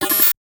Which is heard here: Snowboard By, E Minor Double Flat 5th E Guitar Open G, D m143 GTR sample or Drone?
Drone